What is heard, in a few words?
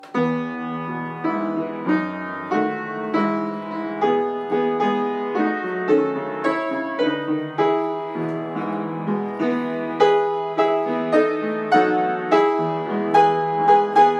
Andante gracioso in E major